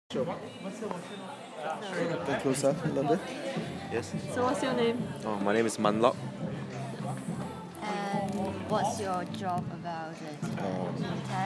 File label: beats